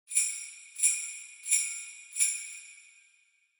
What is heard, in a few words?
Sleigh Bells Shake Gentle Short